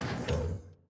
gate open; factorio 2.0.55

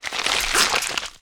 big gore; factorio 2.0.55

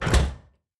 artillery open; factorio 2.0.55

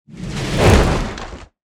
car debris; factorio 2.0.55

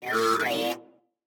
construction robot; factorio 2.0.55

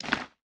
wire inventory move; factorio 2.0.55

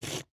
eat; factorio 2.0.55

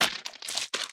spawner gore; factorio 2.0.55

